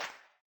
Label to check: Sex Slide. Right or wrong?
Right